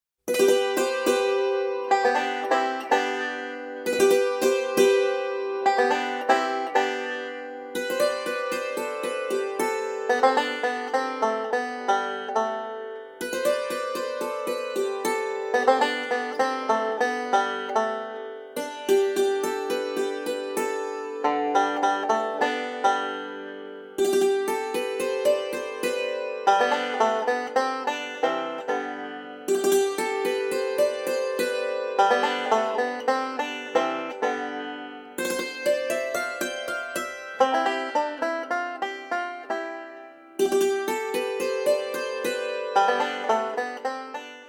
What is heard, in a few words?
Dulcimer